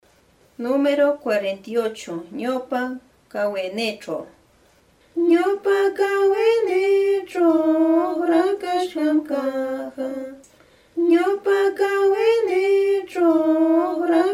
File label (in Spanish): cancion